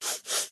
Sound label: dog sniff; DuckShooter